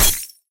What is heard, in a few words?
Pop Glass